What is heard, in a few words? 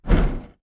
bt footstep jog parts; Titanfall 2